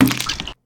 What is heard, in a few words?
Splat And Crunch